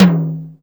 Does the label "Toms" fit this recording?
Yes